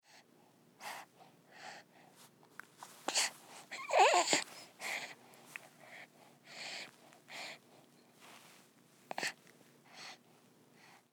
Human Baby Fussing; Babies; Voice

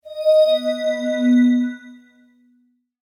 Multimedia Chime, Technology, Multimedia, Chime; BlastWave

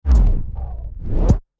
Record Dj Scratch Spin; Record Dj Scratch Spin Slow Down Stop; Dj Sound Effects

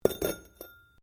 METAL ON STONE IMPACT GENTLE; Metal (Sound Effects:Materials)

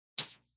Electric Whip

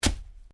Organic stab; Various Organic Foley sounds; Stabs and Slices; Combat

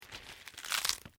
LIVE PLIABLE RIP, Organic Foley sounds; Injury Sound Effects; Injuries (Sound Effects:Combat)

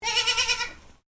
Animal Goat Boer Kid Baa; Animals from Edgar's Mission farm in Victoria; Goat Sound Effects; Goats (Sound Effects:Animals); Foundation1

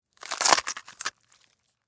Flesh Rip; Horror; Blood and Guts; BlastWave

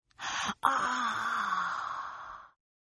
Gasp Aaah Female; Gasp Aaah Female 2; Gasping Sound Effects

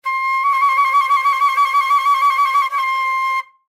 Flute Trill Hold, Flute Trill Hold End; Woodwind; Blastwave

